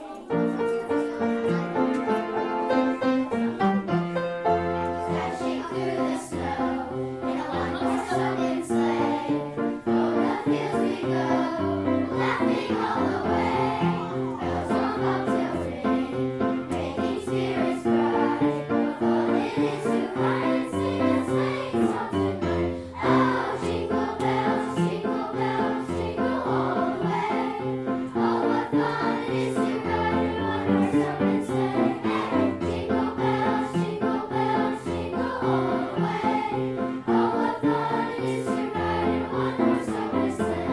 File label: jingel bells beginning of rehearsal